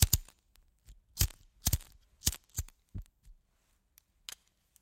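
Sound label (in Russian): two lighters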